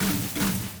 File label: robo double; Apotheorasis